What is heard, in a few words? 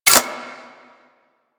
Low Clip Mech B; Warframe; Zylok Prime